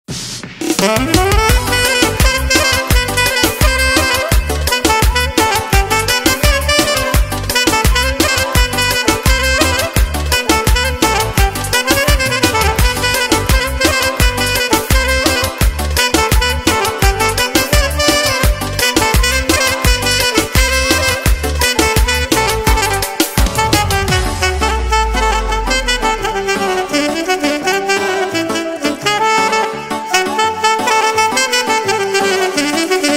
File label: manea sax live